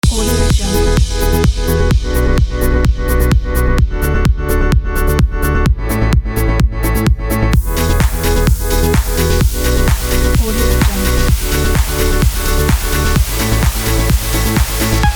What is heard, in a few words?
dance party